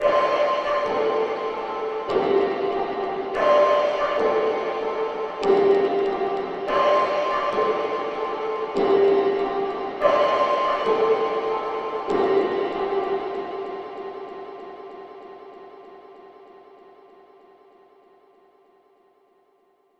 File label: Atmo Wet Tail 144